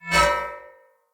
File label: Spear Whoosh Accent; Weapons; Enemy; Spears; NimrodSpear